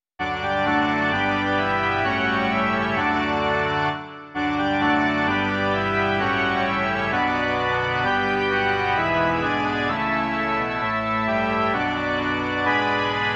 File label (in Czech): varhany; Tobě, Pane, patří dík